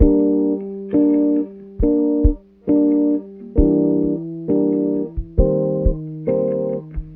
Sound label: keys, Loops, Sad